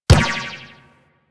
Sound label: Ball Bounce Default; Warframe; Missions; Tenno Ball